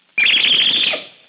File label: scream